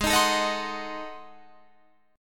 G sharp 7th Flat 9th G sharp down Guitar Drop D, chord